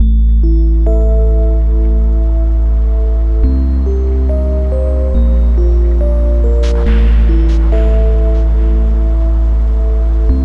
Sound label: Spooky Spooky; Game Music